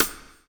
BLUES TK, Backbeat; Spectrasonics - Backbeat

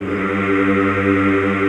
"G1", choir; Best Service; Extended Classical Choir

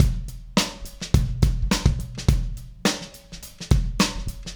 DH Beat, Indie Rock Anthems; Sonic Foundry (Sony Creative Software)

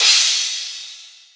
Crash, Drums Cymbal

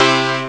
accord; Amiga Soundtracker Sample Packs

